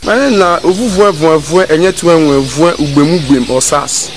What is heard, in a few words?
vuvu